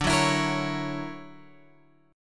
D Augmented Major 9th Guitar Open F, chord; 14,13,16,17,16,x; strummed; JGuitar

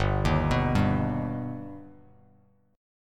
G Augmented, Bass Standard; G+ arpeggiated; 3,6,9,0; JGuitar

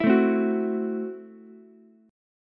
F sharp Minor 7th Flat 5th F sharp Ukulele; strummed; JGuitar

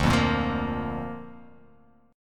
D Minor Sharp 5th D down; Dm#5 strummed; JGuitar

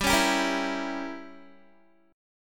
G Diminished 7th, Guitar; Gdim7 strummed; 15,16,17,15,16,x

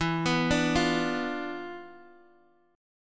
D Minor 6th E, guitar chord; Dm6/E Chord {X X 2 4 3 1} arpeggiated

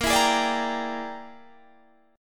E 7th Sharp 9th Flat 5th A sharp, chord

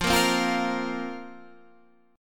F Suspended 4th F

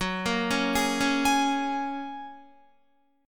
F sharp Major Add 9th F sharp, Guitar; F#add9 arpeggiated; 13,13,13,13,13,16

